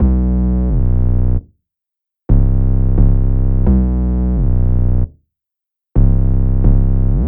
Hip Hop Rap

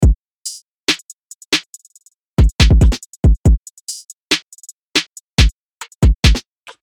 UK Drill UK Grime 140 BPM

